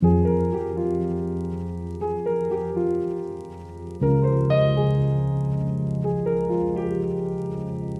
Loop

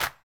Open Snares

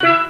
Steel Pan

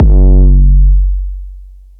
808 - F#; Cymatics

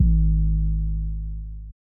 808's

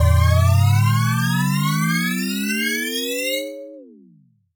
TRAP TRANSITIONZ

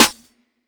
Snares

